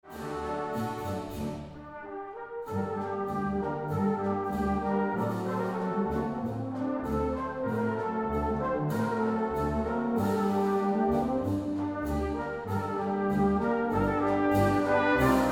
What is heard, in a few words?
Brass Band